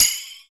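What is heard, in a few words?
PER PROCU, Procussion, percussion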